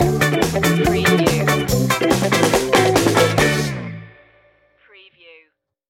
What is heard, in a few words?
stomp stinger